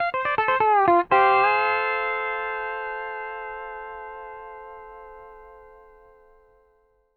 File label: R, Whole Lotta Country, ENDING140; Sampleheads; Whole Lotta Country 1 & 2